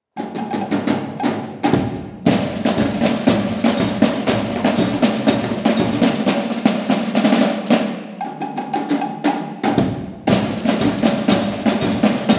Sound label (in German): Tambouren Zugabe